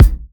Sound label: Kick Stereo, Drums; One Shots; Fool 2